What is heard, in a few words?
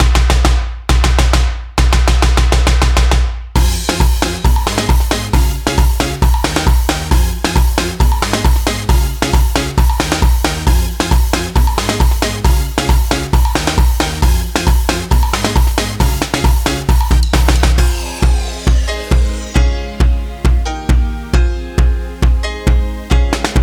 Soca Pop (wPercussion Beats)